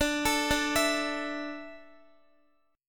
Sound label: D Suspended 2nd D down Guitar, chord; Dsus2; arpeggiated